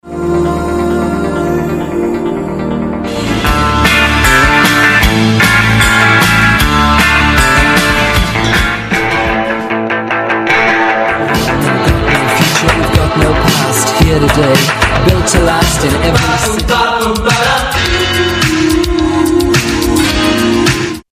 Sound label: 80 mix